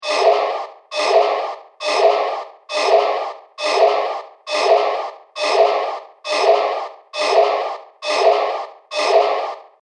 Loop Intergalactic Industrial Machine, sci fi music